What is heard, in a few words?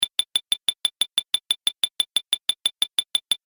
Game App Timer, Video Game Sound Effects